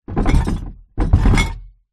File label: household trashcan recycle card glass tins movement bang